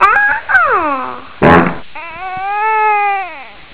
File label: baby fart, farts